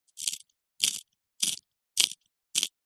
office pencil sharpener metal small sharpening pencil